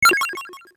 Videogame email; Nokia N79; Audio themes

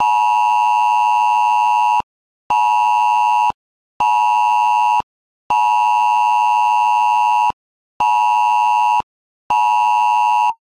emergency alert; LG Smart Folder X100S

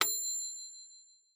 Ding

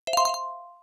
Alert; Samsung; SGH-i310

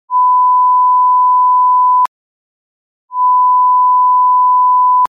1kHz 0dB LR; LG K83 5G

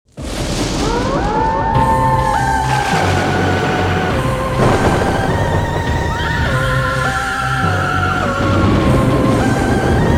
Halloween dark theme; Arphiola ringtones

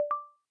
Silent mode off; Samsung Galaxy S7

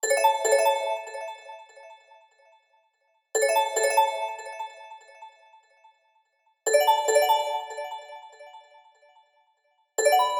system ringtones abstract; Amazon Fire Phone

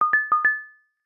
charging error; LG V20

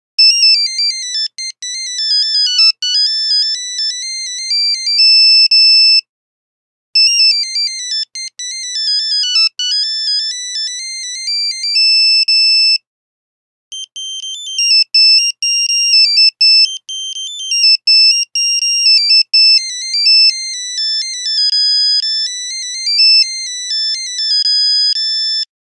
Nokia Buzzer Polka; Windows Phone apps

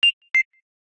Pen beep; Samsung SGH-T399 Galaxy Light

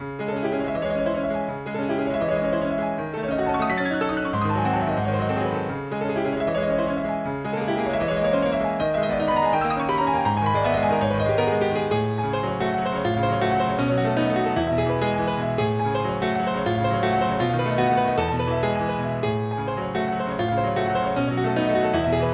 Fantasic Impromptu, ringtones

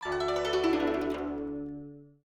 Autumn Wind, notifications; Huawei Mate XT Ultimate

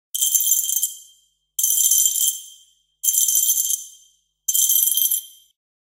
Glass

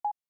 Popup; Samsung SCH-M710 T Omnia II

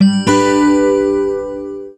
Orange Email, phonetones; Orange Poland

